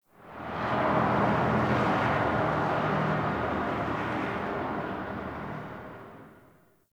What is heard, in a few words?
Distant Traffic P; Warframe; Ambience; NineteenNinetyNine